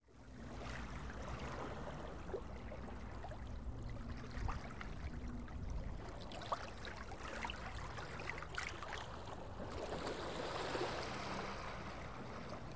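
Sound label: sea sound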